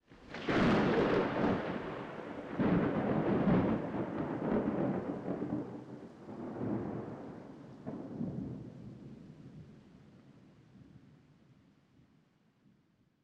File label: atm thunder close; pineview drive